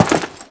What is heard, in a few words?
land dirt big; footsteps